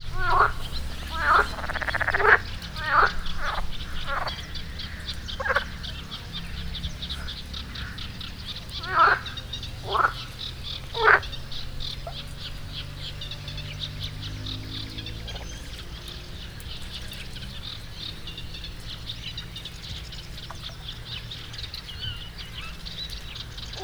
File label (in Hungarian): egy kis szünetet beiktatva enyhe szellő madarak hortobágyi halastavak, tavi béka; termeszetben